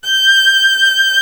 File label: VIOLIN G6, SOLO STRINGS; Greytsounds VOL-1